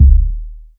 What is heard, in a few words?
subtle reverb steel kick drum sample D# key